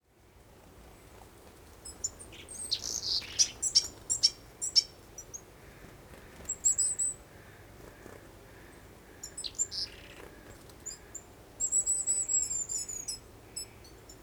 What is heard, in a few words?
ambience birds forest; Dolby Atmos